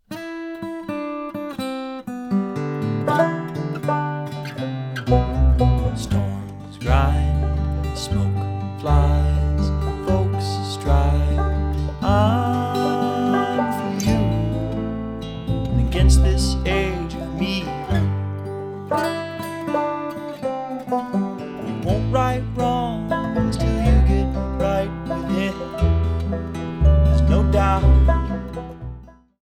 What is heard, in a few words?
String Band